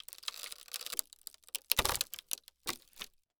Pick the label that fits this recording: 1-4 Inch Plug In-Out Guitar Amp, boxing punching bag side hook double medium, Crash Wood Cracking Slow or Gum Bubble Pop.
Crash Wood Cracking Slow